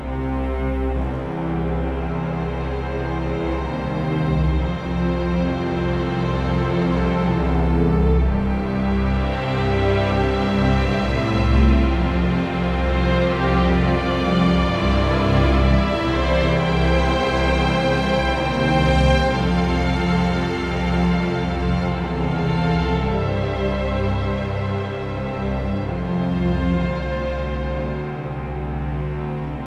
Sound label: LOADING; brothers in arms earned in blood